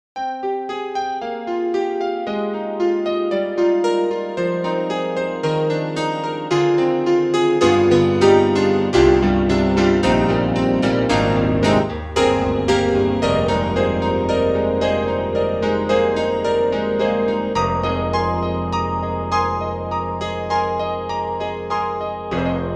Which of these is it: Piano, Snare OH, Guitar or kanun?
Piano